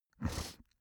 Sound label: sniff grunt; koerpergeraeusche; menschen; soundpool